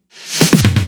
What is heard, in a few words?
Fills 128BPM, Clubsounds